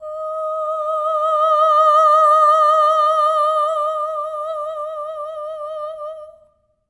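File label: soprano "oh" D#5; Spectrasonics; Symphony of Voices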